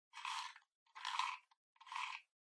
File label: Portable Radio Tuning Dial